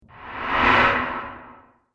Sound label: Transition Metal Swipe; Whoosh Sound Effects